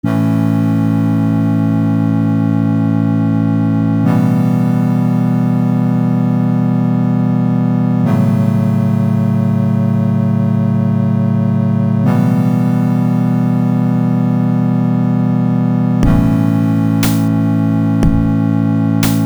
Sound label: song